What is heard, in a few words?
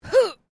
FEMALE EXCERT, Female vocal sounds; Exclamations (Sound Effects:Voice)